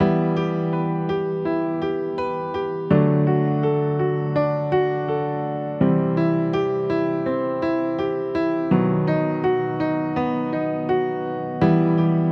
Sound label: Piano